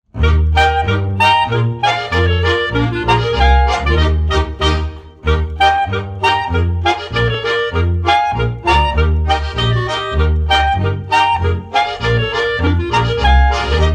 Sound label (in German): Schottisch, Tanzmusik; baselbieter taenze